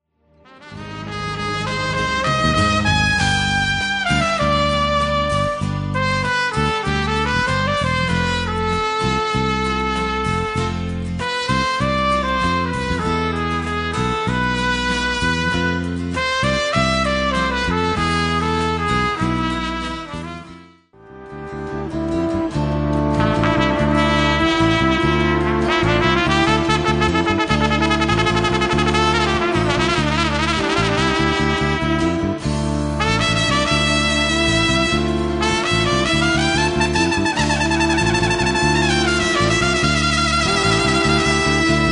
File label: Spanish Trumpet